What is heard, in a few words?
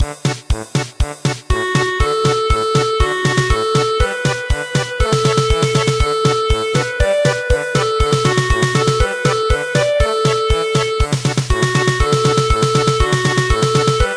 German Polka